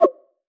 Reverse

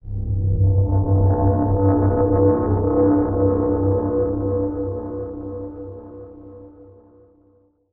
Door Open; Dark City

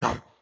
Chop Attack; Caffiend Caelan

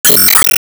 Card Appear

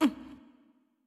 Hurt; Jackie Hurt New

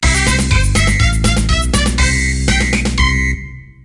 Jingle Happy

